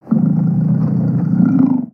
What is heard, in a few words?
Pet Lion Growl; creatures pets